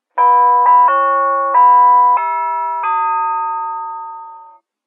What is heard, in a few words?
happy birthday, door chimes